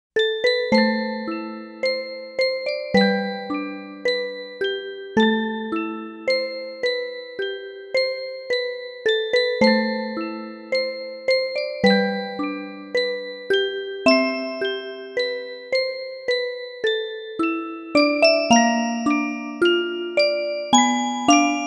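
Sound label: Orgel Version, music box; Dark Cloud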